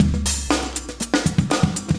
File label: 120, loops; Sound Effect Library